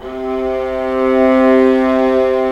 viola C2; AKAI S-Series CD-ROM Sound Library VOL-2

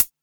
Quasimidi 309, Drums

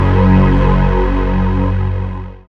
SYN DANCE; Sound & Vision - Gigapack II

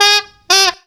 bell sax; Zero-G; Funky Elements

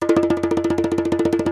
ASHIKO, African Perc

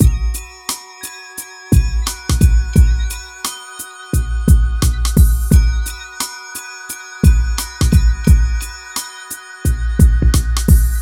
Hip Hop and R&B Drumloops, mix; Best Service ProSamples vol.01; AKAI

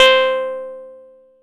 Dance Floor Bass, Multisample Basses

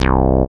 SYN TB303, Roland

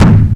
SY35, Yamaha Sy35, Drums

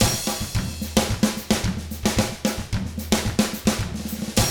110 SMBA samba; ILIO; Double Platinum Drums 1

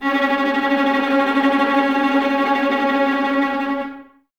Violas Tremolo C#3, String Ensembles; Miroslav Vitous

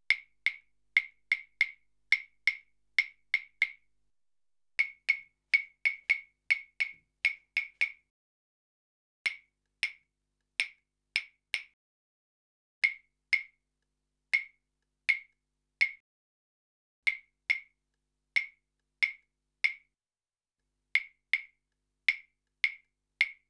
Salsa Picante; Masterbits; World Zone VOL-3

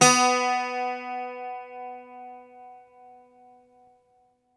Greek Bazouki, Plucked Strings

